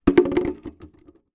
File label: household watering can small down on hard surface